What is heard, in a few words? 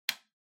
Wall Outlet Regular Plug Lock